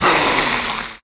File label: attack hiss, headcrab, creatures; headcrab classic; vox